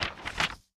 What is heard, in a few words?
paytable Hidden; imperialriches